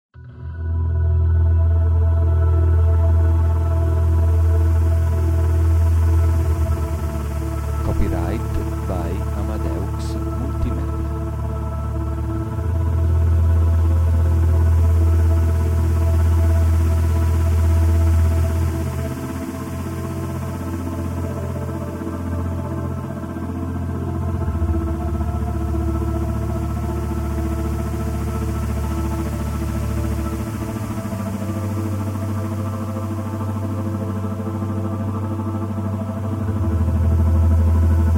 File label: ASMR Soundscape